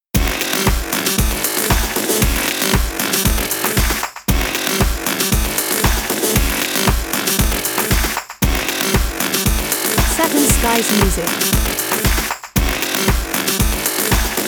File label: Modern Promo